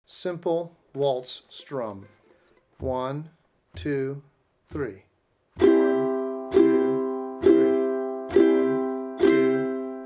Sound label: Simple Waltz Strum